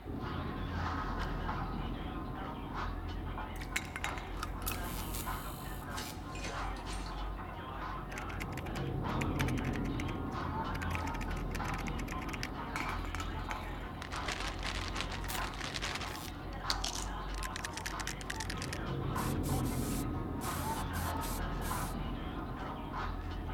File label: Amb Dojo Lair; Fruit Ninja; Android iOS mobile gamerip 2010